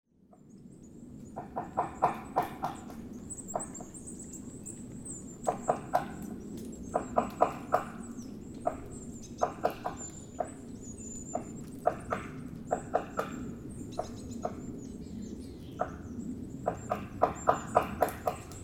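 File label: White-backed Woodpecker; chichibu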